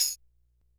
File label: Yamaha RX-120 Tambourine